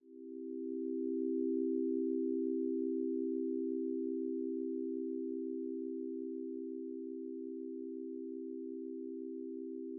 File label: bg music